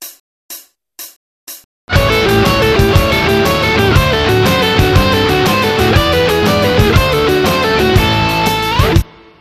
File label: blues basis, guitar